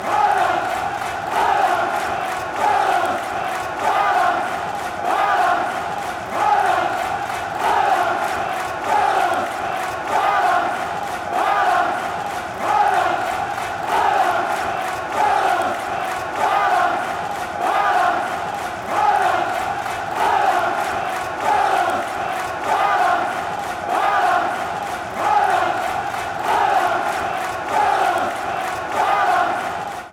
haway lads fast

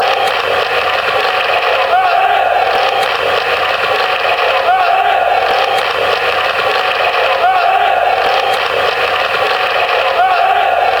fan chants; harriers

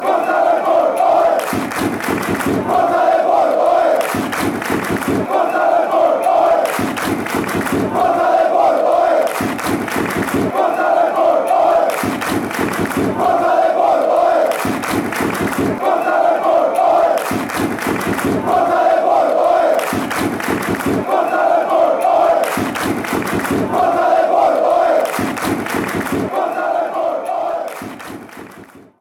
come on ole, chants; depor

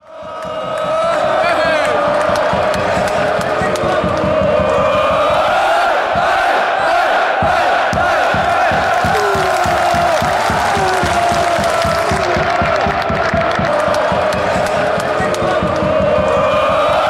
woah hey, fan chants

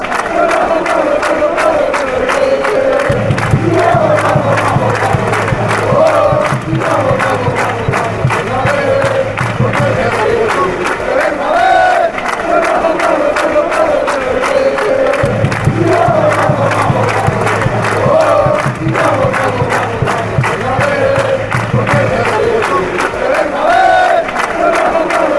come on come on, chants

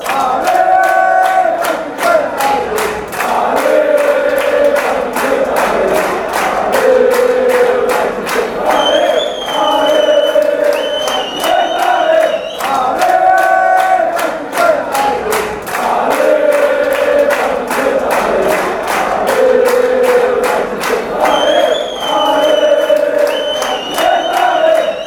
ale ale, fan chants; celta

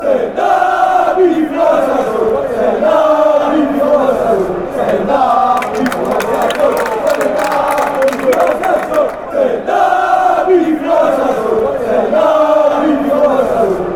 chants; stand up

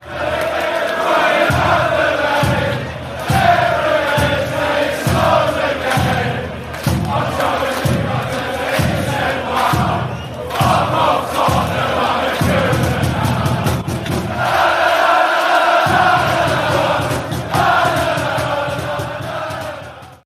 player chant; eberechi eze arsenal; united kingdom

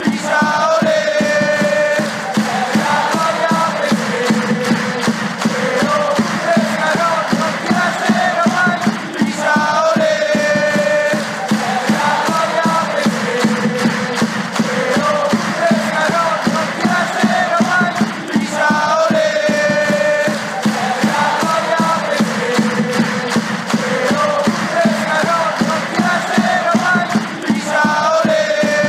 best pisa chants

